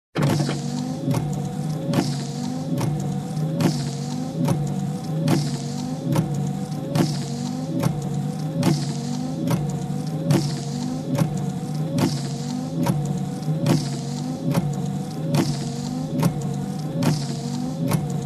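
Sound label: Car windshield wipers sound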